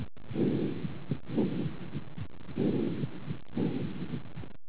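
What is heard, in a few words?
cavernous, pulmonary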